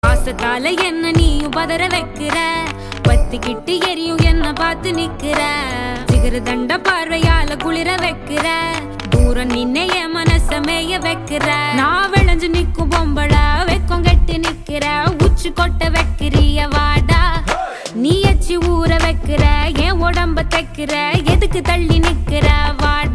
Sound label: Tamil Ringtone